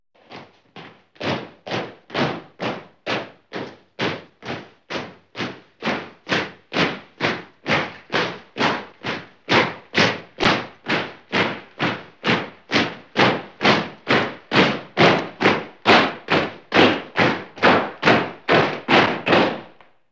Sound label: Men Marching; KMP Film Video Sound Effects